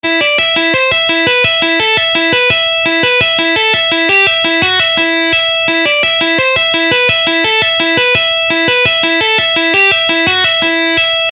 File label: guitar tapping exercises